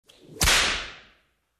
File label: Single Whip Hit in hall